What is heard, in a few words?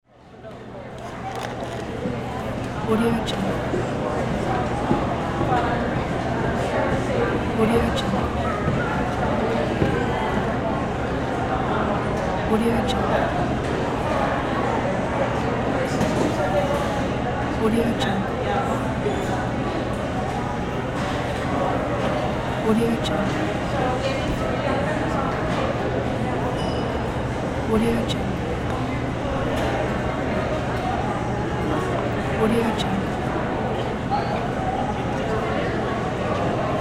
indoor city market